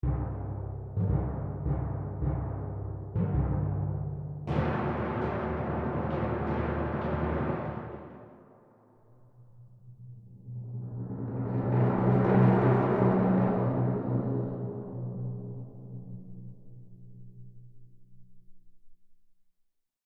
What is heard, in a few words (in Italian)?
percussioni timpani